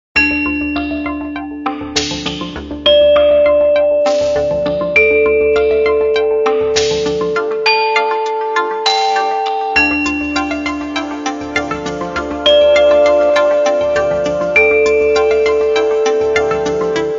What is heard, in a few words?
iPhone XS Cascade